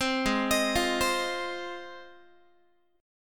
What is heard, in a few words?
G sharp 6th G sharp down Guitar, chord; G#6; arpeggiated; 15,16,18,15,16,x